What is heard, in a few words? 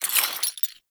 Pickup Shackle; Warframe; Cephalon Weave